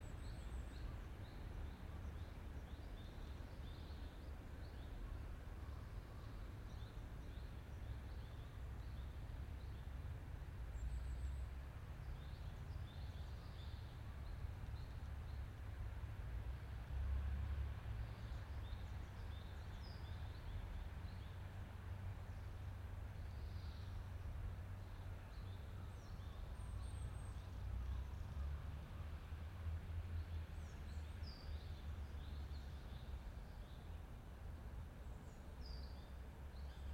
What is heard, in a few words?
yamanakako sound